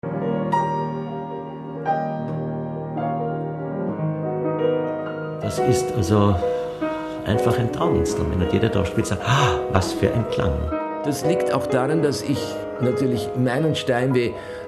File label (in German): Klavier Hoerprobe; Physik/Akustik